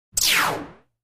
Synth Gun Ricochet Mu, gun ricochet

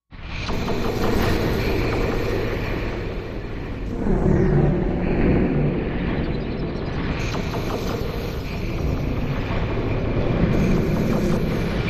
Train Dreams Machine, sci fi ambience

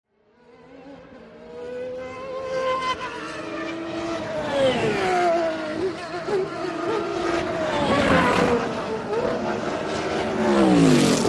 Sports Car By Turn

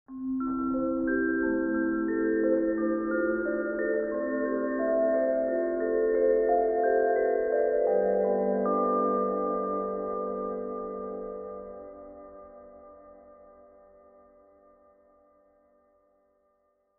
Vibraphone Dreaming; mallets

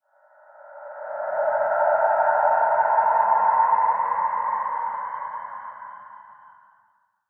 Horror Atmos Siren

